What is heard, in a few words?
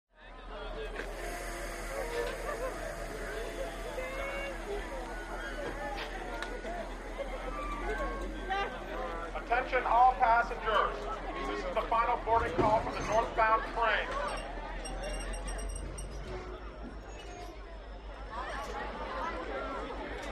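Crowd Walla Conductor, steam train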